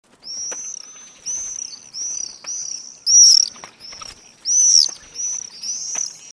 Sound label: Common Swifts Apus apus; Now it´s summer and there´s nothing like the sound of Common Swifts Apus apus dashing by close to my windows.; Turmsegler; Patrick Franke